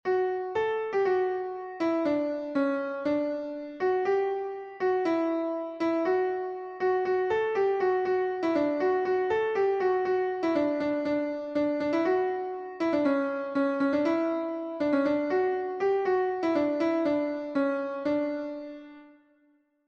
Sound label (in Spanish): contralto; AL MUNDO GOZO PROCLAMAD